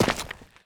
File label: dirt, footsteps; player